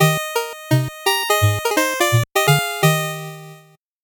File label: 8bit jingle fast